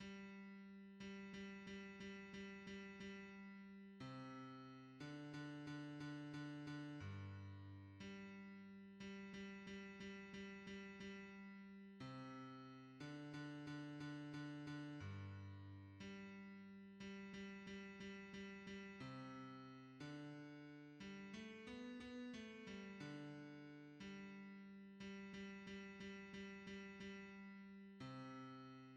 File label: hymns, bass; As debtors to mercy alone